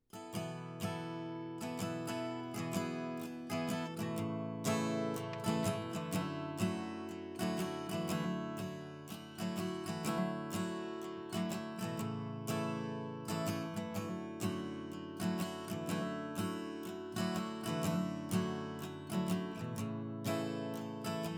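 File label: Razer Seiren Mini guitar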